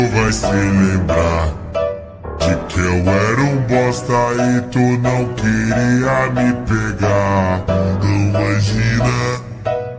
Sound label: phonk loud